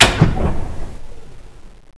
button

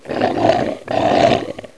attack

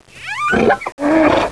pit drone melee attack; betapitdrone

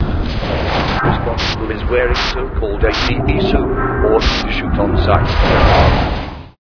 end portal; chungo

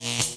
spark

